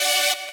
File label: saw